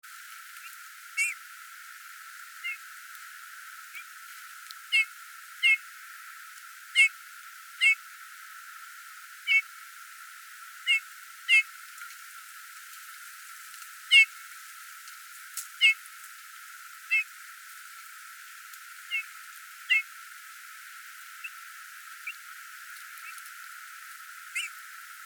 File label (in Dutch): Goudvink